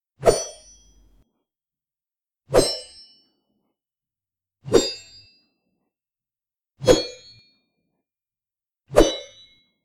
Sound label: Sai Swings W Ringouts Only